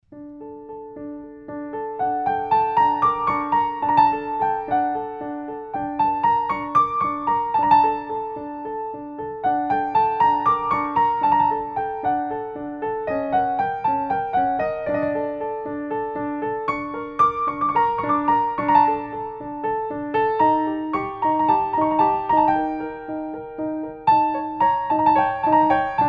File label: mystic flute